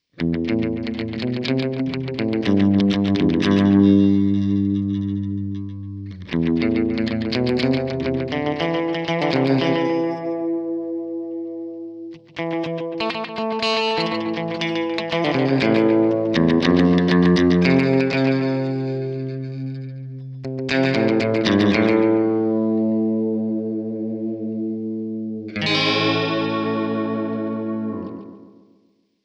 EQD Silos Delay Analog Mix 1 Uhr Repeats 12 Uhr

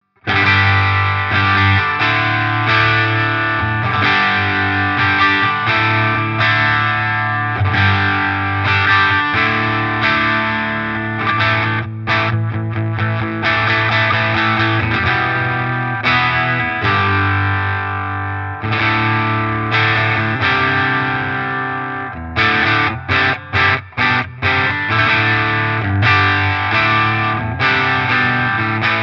NUX Diamond 63 OD Top Boost an Cut fully CCwise Steg PU

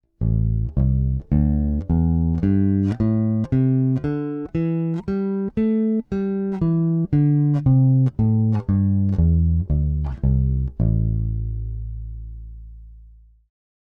Big Blade Neck PU aktiv; Nordstrand

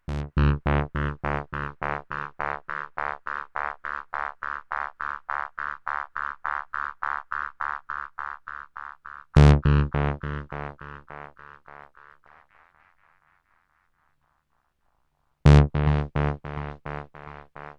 Delay mit Modulation

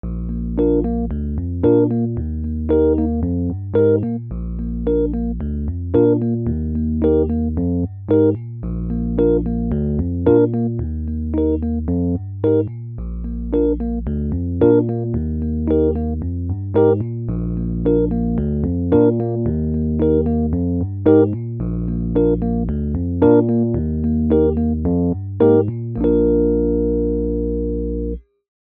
Reggae Begleitung binaer

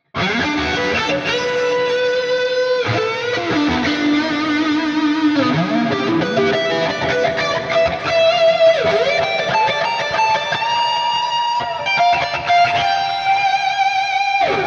Gitarren Plug-ins im Vergleich Archetype Highgain Lead; Petrucci

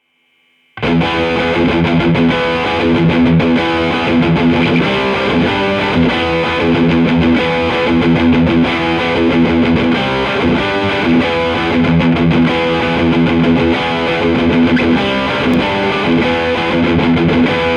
bock 187 e gitarre fat EQ; universal audio

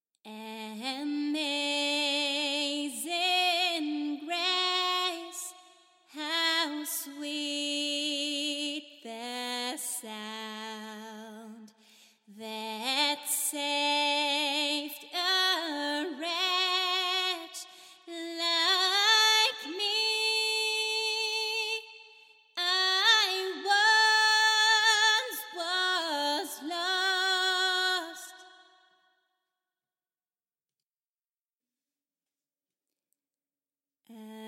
Amazing Grace mittel twang

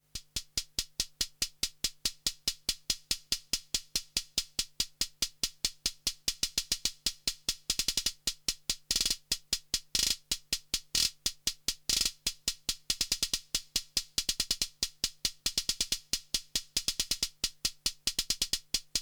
Soma Pulsar 23 Patch Die Clock

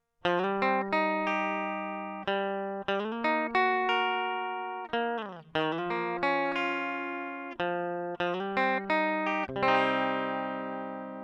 Binson Echorec E Gitarre ohne FX; T-REX

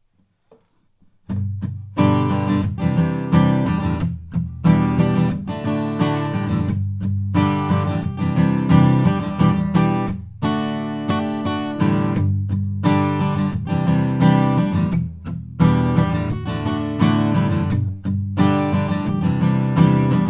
telefunken m60 aufnahme gitarre niere kugel

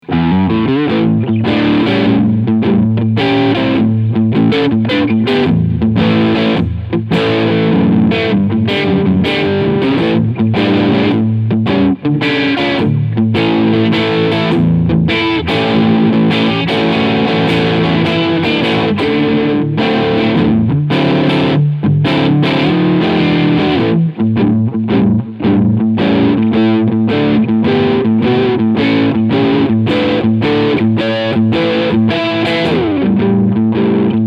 Crunch beide PUs

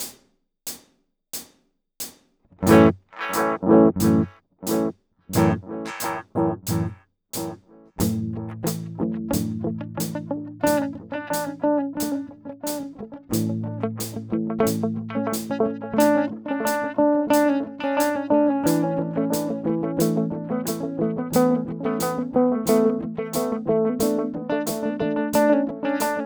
Coole Rhythmen mit Delay 90 BPM; Guitar Rig 7; Identity Formation

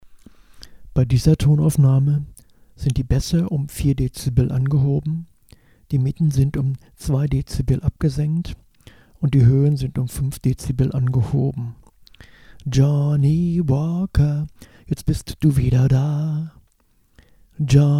Akustik Duo; selbst abmischen

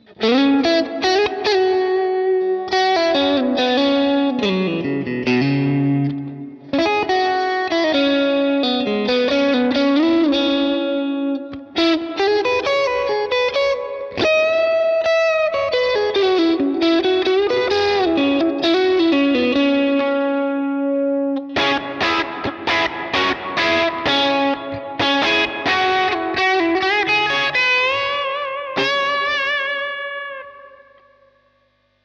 Lead Zwischenposition Neck Middle

